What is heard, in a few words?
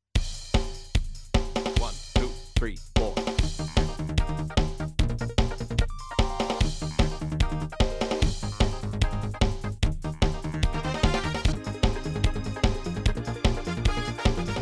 Tongue Test Eb @ 144